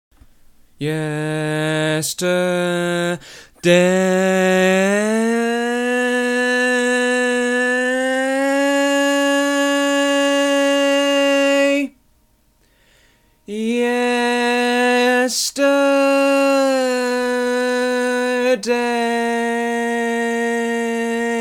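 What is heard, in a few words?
baritone, barbershop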